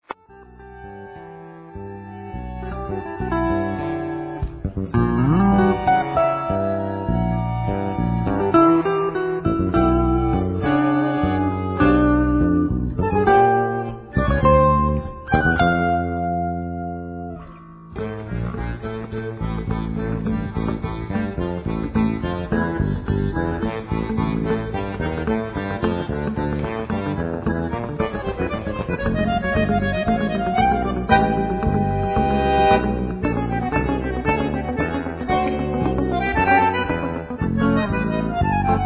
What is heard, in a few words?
Jazz meets Tango